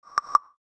chat msg in bg; Nokia N900

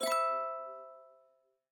Cable Connect Pure; LG Wing 5G Verizon

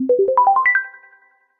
Bubble, ringtones

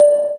Video Stop, ui; Motorola Moto Z Play

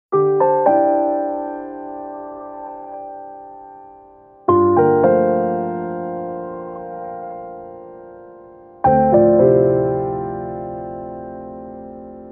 Keys

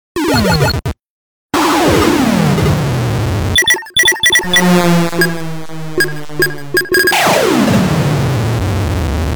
Videogame clock alert; Nokia N79; Audio themes

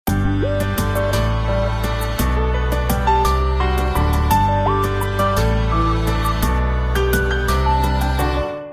Classical; Sony Ericsson; M1i Aspen

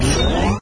pad enlarge; LG GW820 eXpo

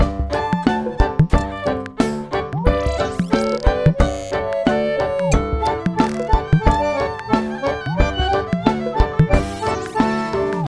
Dadgad; Sony; Xperia Movie Creator Music

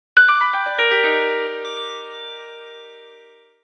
pulse alert, Nokia; Nokia 8800; Alert tones

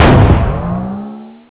cannon shoot; Nokia; 5800 XpressMusic; Bounce Touch

